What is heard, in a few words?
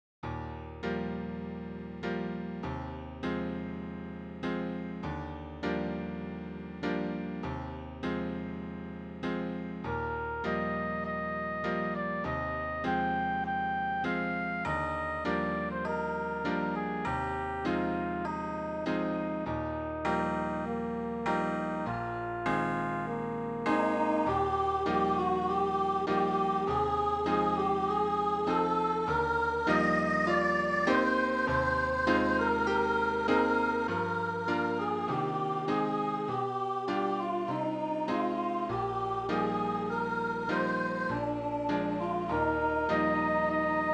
I Heard the Bells SSA bells flute, 2 part choir